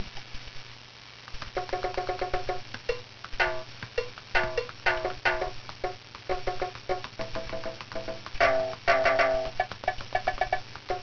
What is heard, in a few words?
usb drum; dreamcheeky